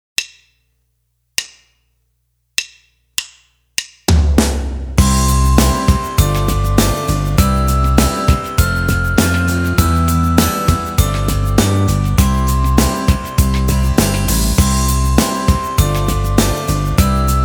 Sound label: glockenspiel